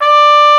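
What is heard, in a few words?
Trumpet mf D4; Digital Sound Factory Virtuoso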